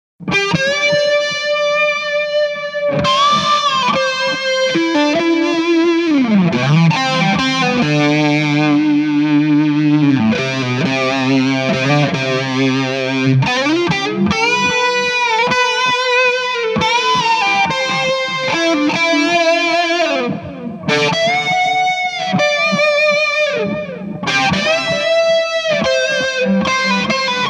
Ram Muff; Same as above but without backing track

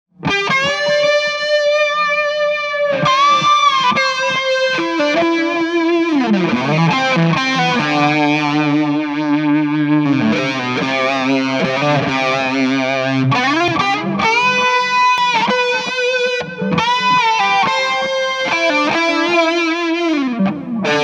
Ram Muff; BK Butler-Chandler Tube Driver; Boss CE-2 Chorus; Nova Delay; without backing track

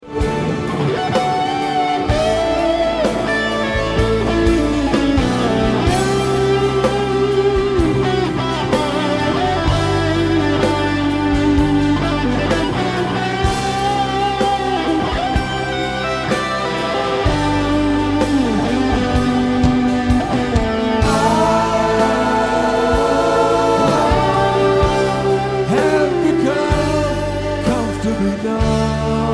solos, inner ear monitor source; EHX "Ram's Head" Big Muff; The Forum; Inner ear monitor mix 2016

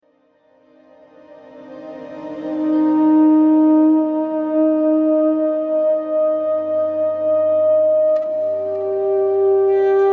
isolated e bow guitar

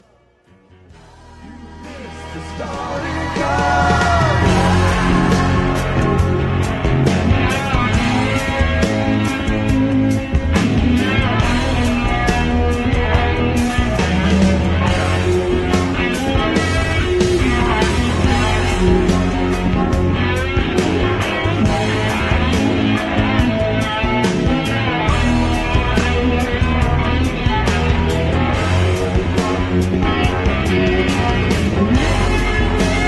solo; PPV Broadcast

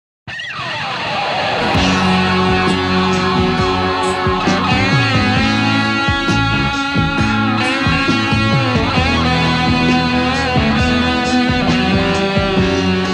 solo Cornish G-2; SSL5

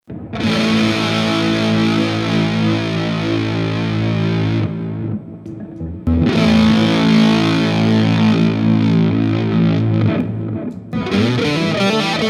Lightly Boosting a Big Muff with TD

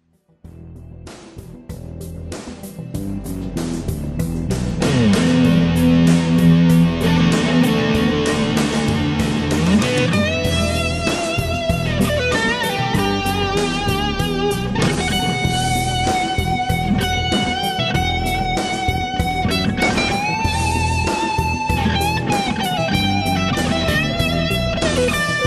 solo

